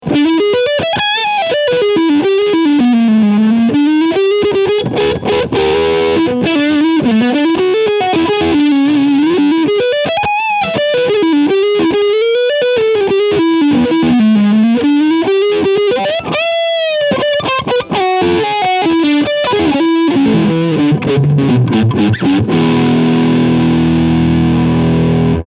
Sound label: Leadsound Front PU